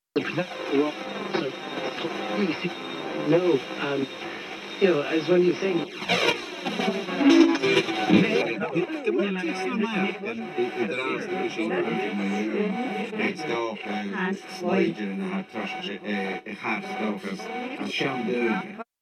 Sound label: Foley Radio Gibberish